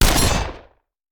Shotgun; Sound Ideas; Guns

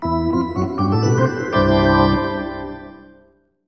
Hockey Organ

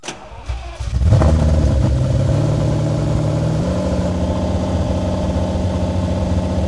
Aston Martin Start Loop; Automobiles

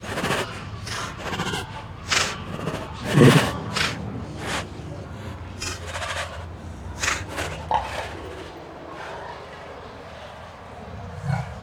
Two Tigers Make Many Annoyed Huffs and Snorts; Sound Ideas

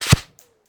Bullet Pass By; Sound Ideas; Guns

